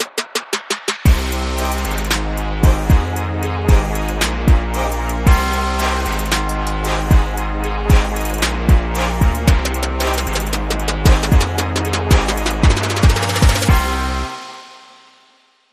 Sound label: Slow Motion Explosion